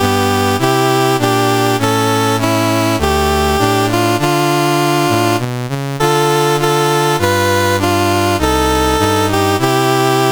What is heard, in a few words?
CSG8580R5, National Anthems; Jamaica; Dave Marquis